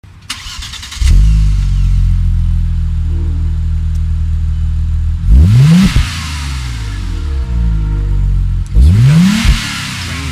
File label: outside revs, exhaust